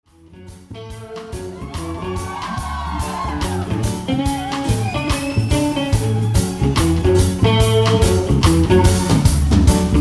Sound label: Reggae